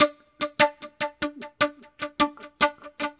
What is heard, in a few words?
Shaku, Wind Instruments; Sonic Foundry (Sony Creative Software); World Pop